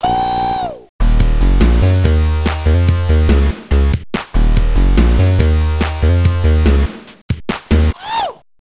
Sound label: Dance Attack (with voice); Michael Jackson's Moonwalker Genesis soundtrack